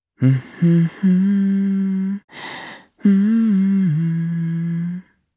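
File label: Vox Hum, Japanese; Lucias; kingsraid; voices heroes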